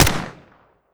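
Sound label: weapon fire player; gmod; weapons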